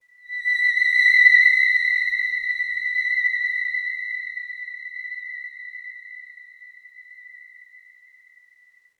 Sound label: Oneshot Synth